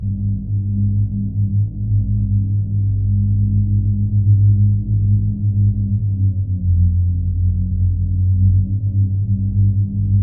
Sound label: space horror; duke